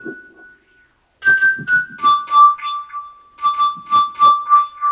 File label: Flute; Sonic Foundry (Sony Creative Software) - Stylus Pressure Urban Grooves on Digital Wax